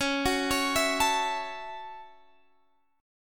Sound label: F sharp Minor 7th C sharp